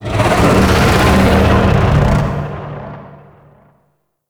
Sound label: Void Dragon Vocal Ability; Warframe; Duviri